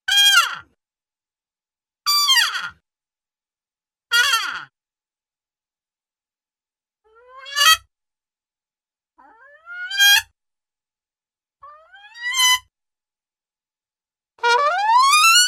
Muted Trumpet - Short Blasts; Hollywood Edge Cartoon Trax

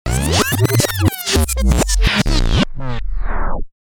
Retracting Scratch; DJ sounds

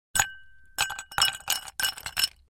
Ice Clinks in Glass Without Liquid; Household

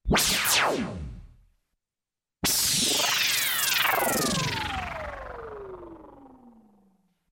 POWERSHOT

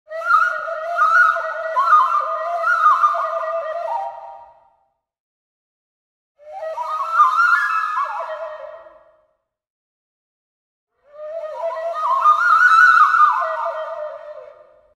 Various Colombian flute patterns; Music effect; Hollywood Edge Sound Designers Tool Kit

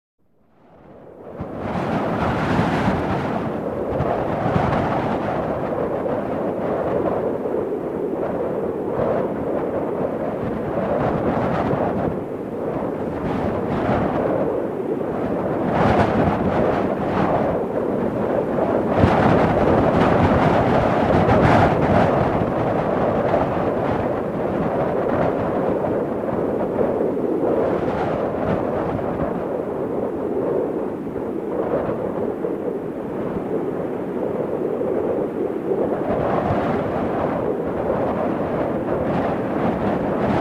Heavy Wind Gusts Howling

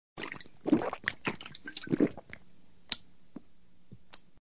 Human Gulp Beer; Hollywood Edge Foley Sound Library

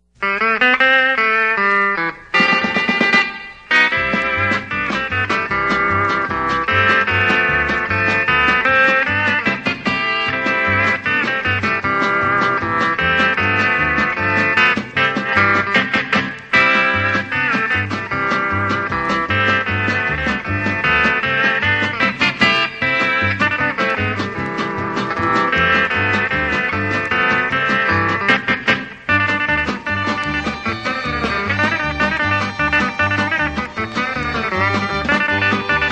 Polka

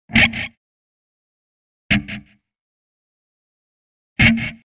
Muted electric guitar chord effect; Hollywood Edge Sound Designers Tool Kit

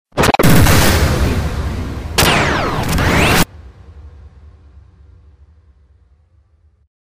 Crash Test, Stabs & Bursts; Best Of N2O Library

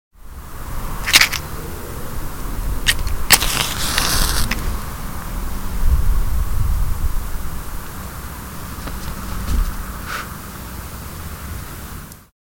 Match Strike; 100 sound effects

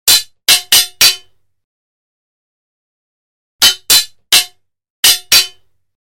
Broad Sword Hit Combo; Hollywood Edge Martial Arts and Human Impacts

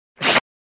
Sneeze Very Wet Could Play For Nose Blow

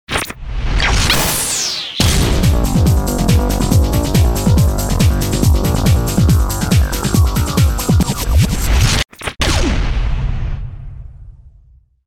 Firecracker Groove; DJ sounds; Mtrax

